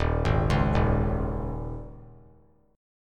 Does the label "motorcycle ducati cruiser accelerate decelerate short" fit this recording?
No